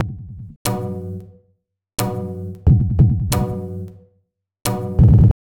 Pure Tabla, 090BPM; Zero-G

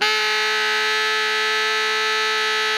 TENOR GROWL A 4, Saxophones; Greytsounds; Philip Wolfe David Crozier's Saxophones

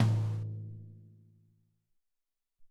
Brst Tom, Alesis SR-18

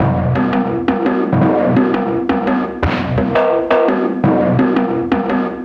Mixed Percussion, percussion loops

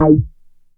EB3, KORG DW8000, Vintage Keyboards; Big Fish Audio - First Call Vintage Keyboards

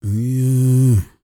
CROON, World Voices, BRAZILIAN; Spectrasonics Vocal Planet CD5

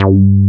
TEK G3, TEK BASS; Drums In Your FACE!

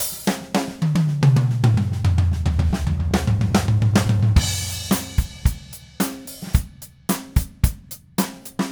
Red Maple Groove 110, DW Red Maple Kit

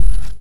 FX Hit; Drums & Drones

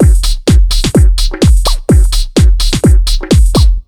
127 BEAT, Fresh Disco House

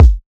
kick E, hip-hop drum kit; 99Sounds x Monosounds; Moon

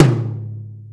Concert Tom 4 ff; Mo' Phatt; Digital Sound Factory Mo' Phatt

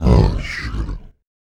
VOICE FX, Dance Vocals

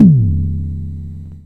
Electron Sidstation, Drum Machines

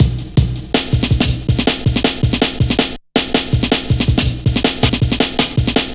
Drum Loops, Jungle

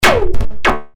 kick bass

